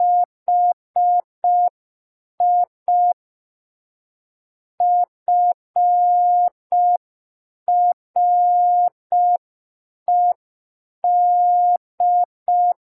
Morse code P

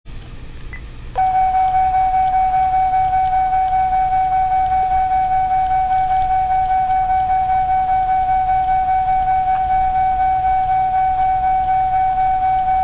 Zero Beat

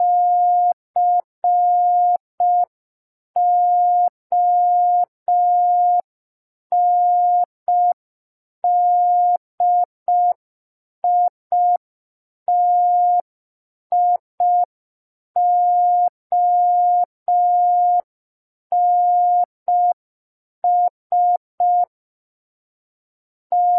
Morse code P

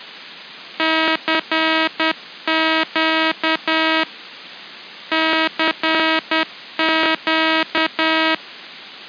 Spark RX Blower; Spark Gap Transmitter

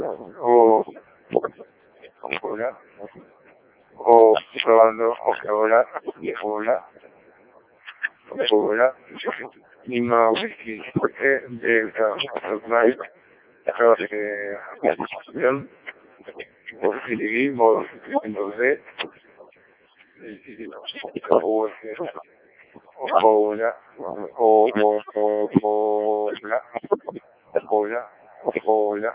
FreeDV

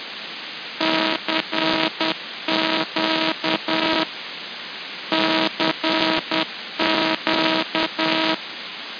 Spark RX No Blower; Spark Gap Transmitter

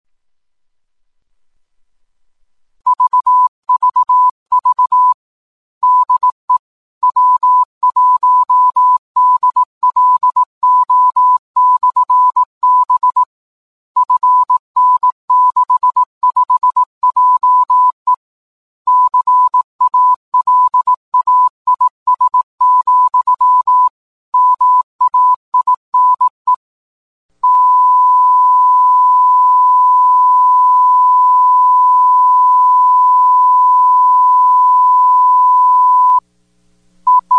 10m beacon string

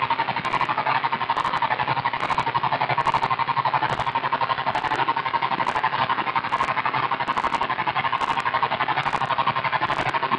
20 MHz RFI

